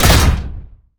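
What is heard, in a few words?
tekken, impact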